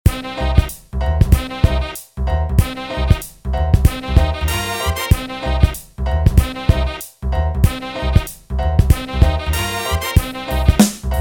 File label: blues